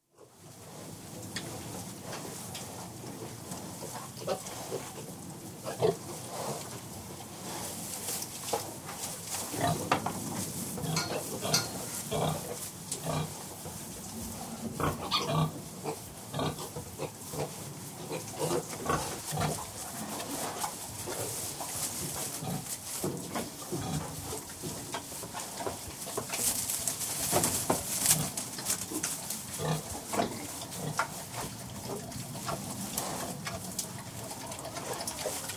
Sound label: Farrowing Pens, interior, general atmosphere with lots of snorting, squealing & grunting; Pigs; BBC